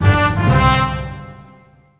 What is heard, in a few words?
runs; indiana